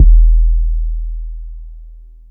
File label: Bend808; 808s